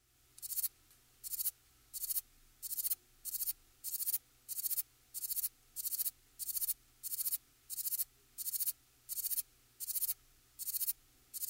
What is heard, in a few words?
grey bush cricket